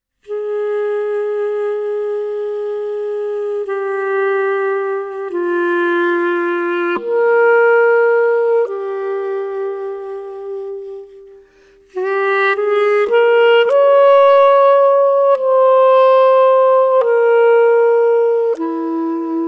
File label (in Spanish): clarinete solo